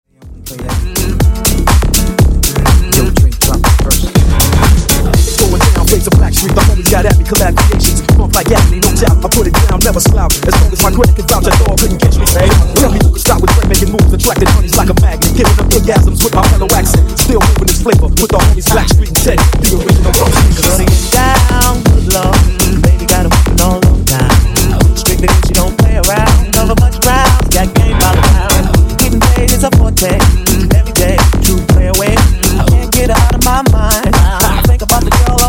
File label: Funk 122Bpm